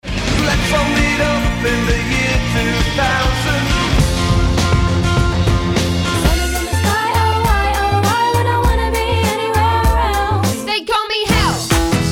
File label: Brit Hits mixdown